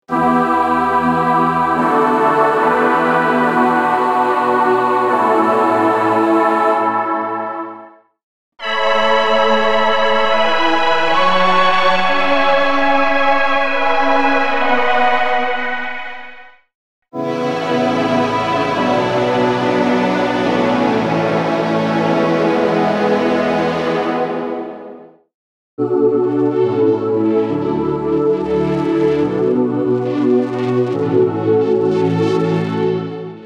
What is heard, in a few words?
Chords Hardstyle & Hardcore; Unison; MIDI Wizard